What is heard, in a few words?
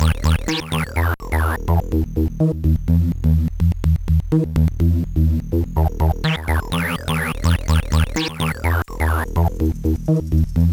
trance; ANALOG